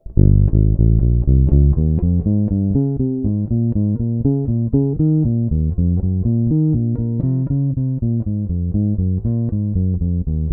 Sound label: Jazz P, J Bass